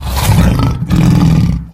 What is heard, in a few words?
giant aggressive; russian; sven; russian sounds